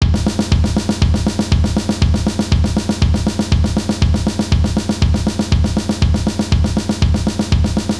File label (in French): Break